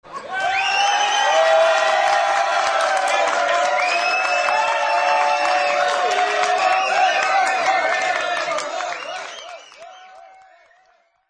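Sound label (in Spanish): APLAUSOS Y GRITOS OVACIÓN PÚBLICO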